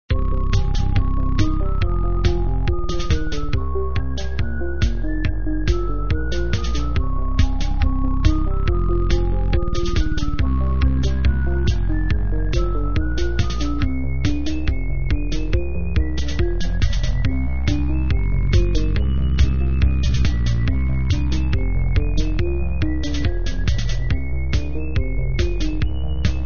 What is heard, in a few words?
Loop Slow; Trick or Treat Smash Online; Windows